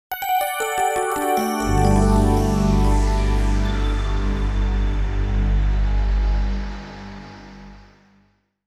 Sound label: Signal Music; EZ2DJ Platinum Collectors Edition; AmuseWorld